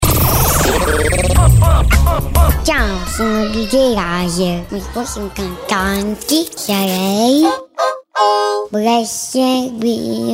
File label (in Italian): LASER CANTANTE